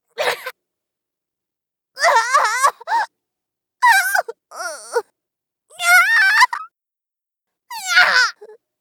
ダメージ声(大); 効果音なし

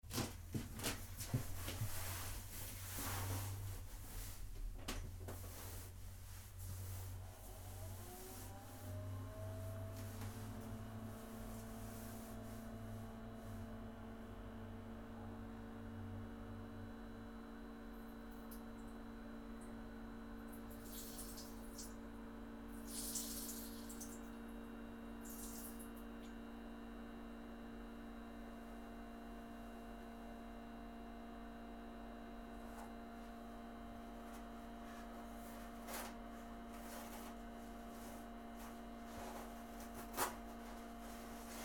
脱ぐ、座る、おしっこ音、二度ふき、履く、流す（声なし）, トイレ

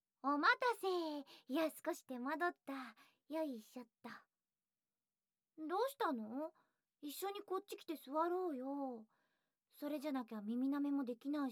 意地悪 耳舐め

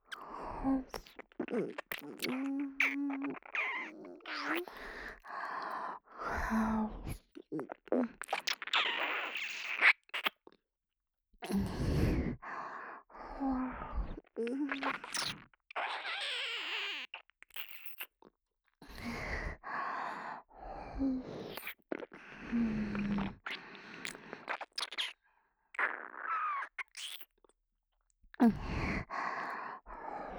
ちゅぅぅ～っ, 右耳

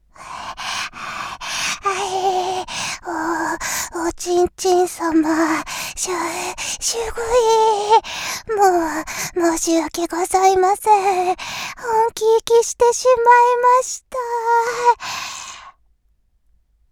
はぁっ はぁっ あひぃ…

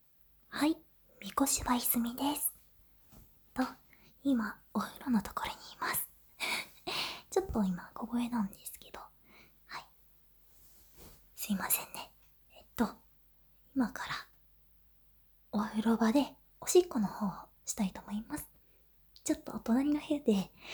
ノイズ除去前 おしっこ モノラル

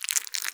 深めにストローク, ピストン運動 激しめ

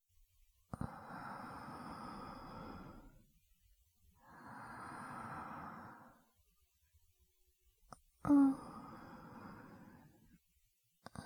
寝息ループ

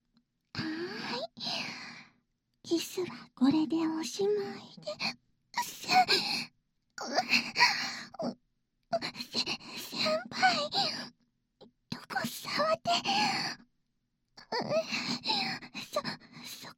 もっと耳舐め, ASMR; 効果音無し; 手マン愛撫

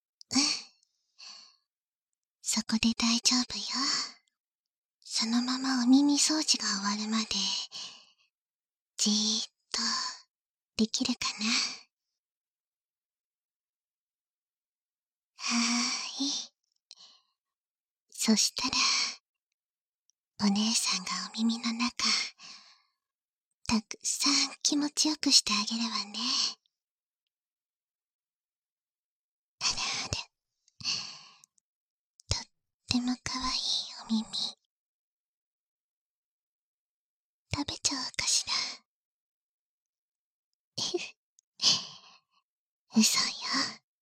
耳かき（バイノーラルマイク収録 効果音あり小さめ）; 効果音小差分

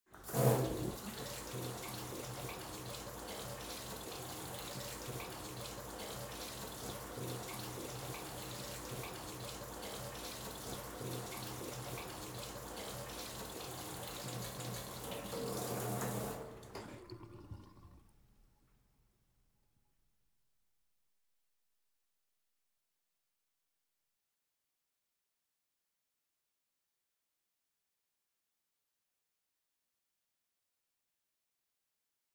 ソーセージ作り体験(専用の機械をつかったソーセージづめの音と、コンプレッサー)

